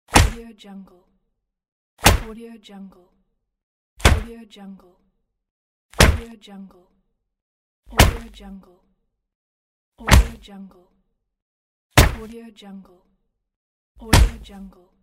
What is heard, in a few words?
punch designed